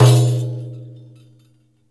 DAIRES OPEN, Mediteranean Traditions percs

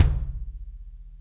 KICK

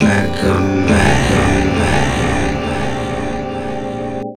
Fx Vox; Equipped Music - Loungin House

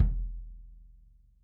kick, Drum Kits

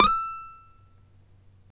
Cavaquinho Slide; Guitars

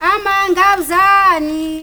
SOLO FEM; Africa

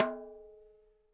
Roto, Drums; PX7; Digital Sound Factory Protean Drums

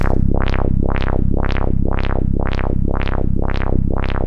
BASS C2 R, Jungle; JGL BASS; USB Soundscan vol.05; Explosive Jungle; AKAI; 90s sample CD

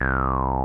TB303

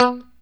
JC POP, STRAT

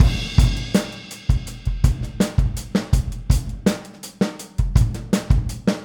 Boogaloo, Full Mix, 82 BPM; Drums; Verse; Sonic Foundry; Drums from the Big Room The Mixes

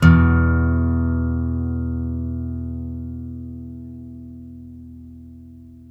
5THS E, Blues Guitar; ILIO; Fretworks

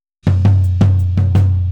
Boogaloo Fill Tom Floor, 82 BPM; Sonic Foundry; Drums from the Big Room The Mixes

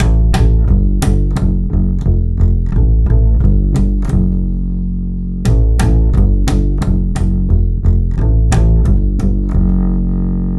Acoustic Bass; Sonic Foundry; Leo Cavallo Bass Taster

